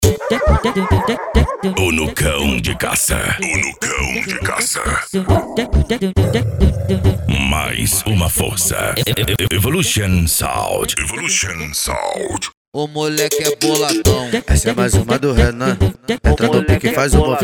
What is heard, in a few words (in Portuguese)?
funk ritmado